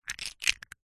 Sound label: leisure bricks x4 in hand close squeeze crunch; lego